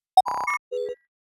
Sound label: action complex, CYBERSPHERE; Phil Michalski